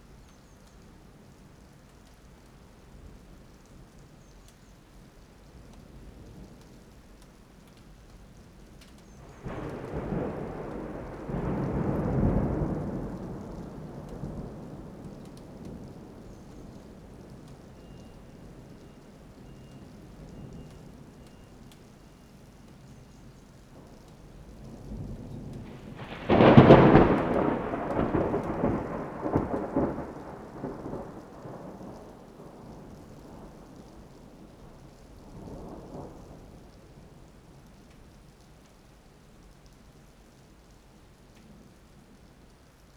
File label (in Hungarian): előbb egy közepes utána egy nagy, mennydörgés